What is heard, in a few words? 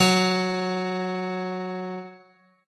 piano